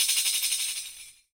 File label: tambourine rattle